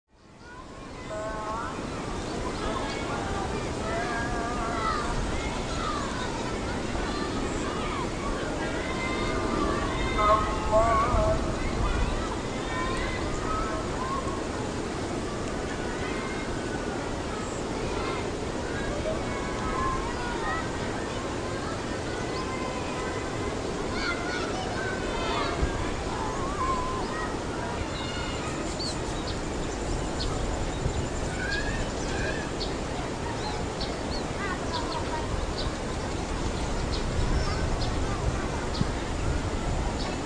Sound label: Rice Field, Outdoor ambience. Quiet afternoon in rice field; Breeze, insects, few birds, children playing in far dis; Batavias Rice Field; Environmental Sound Effects; LynneMusic